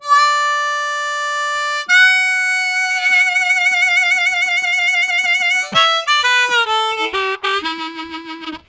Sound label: Wow, Harmonica; Zero G